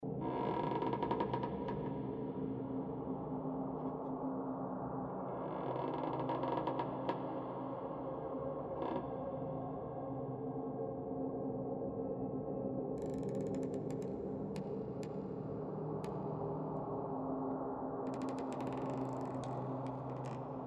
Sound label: bright embers orphanage, ambience; pf2e; hellbreakers